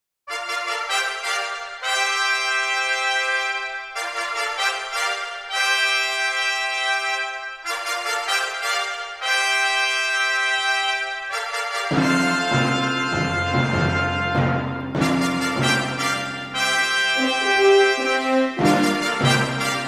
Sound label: Royal Fanfare; LARP